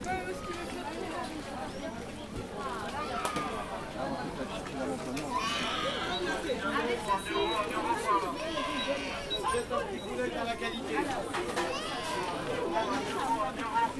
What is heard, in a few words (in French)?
Angers - Marché commercial du dimanche - Marche puis course; Ville; ZoomH2N